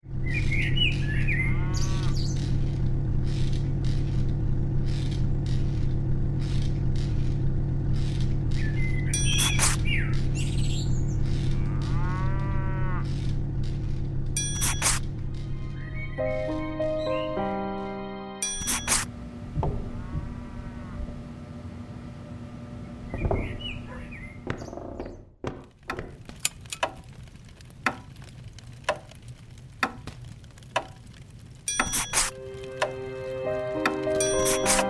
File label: Interactive Audio Example Branching Phrases